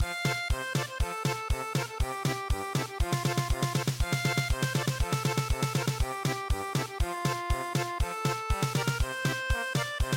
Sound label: German Polka, midi traditional